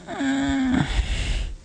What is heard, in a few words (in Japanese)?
「くぅ～ん…(子犬)」